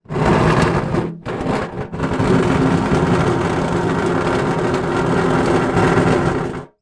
small metal case tin drag slide on concrete; foley; zapsplat

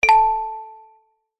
alert bell ping wooden

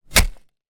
industrial shovel single dig into soil; zapsplat

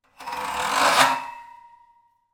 foley metal scrape grill small

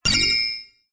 science fiction computer error beep tone; zapsplat

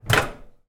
household microwave oven door open glass

